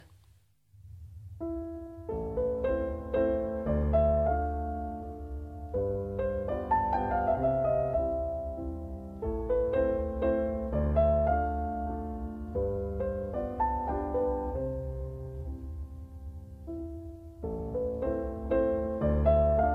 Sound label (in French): Piano extrait